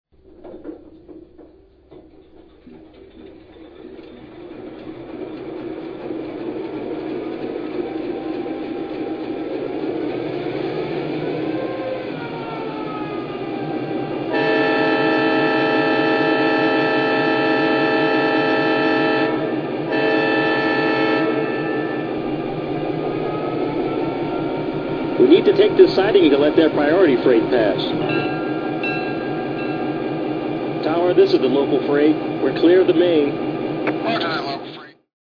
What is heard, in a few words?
EMD GP9, trains